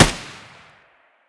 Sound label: scar muzzle mono distant